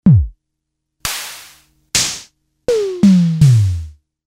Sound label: Tama TS-305 Manual